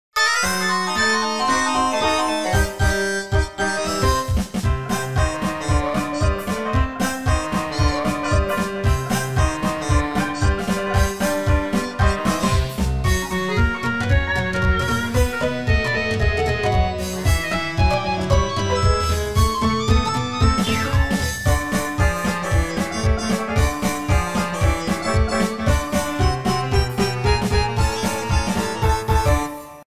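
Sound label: Motorola ringtone; ringtony motorola razr v3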